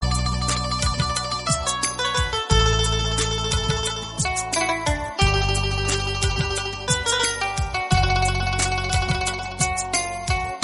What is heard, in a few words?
instrumental tone